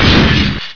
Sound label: crash tinkle; Despair 1.6